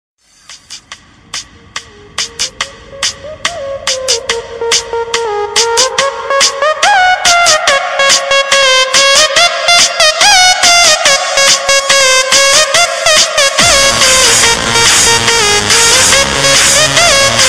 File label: ringtone techno